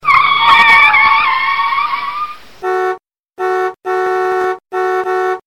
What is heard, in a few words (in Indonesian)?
Klakson Mobil